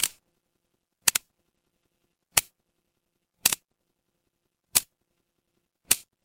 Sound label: fire electric spark